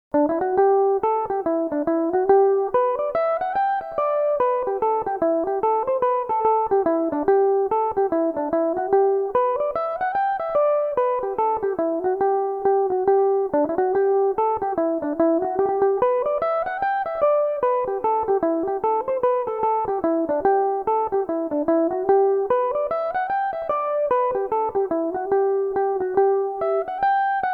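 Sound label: G major, mandolin; Belmuse EM-190 electric mandolin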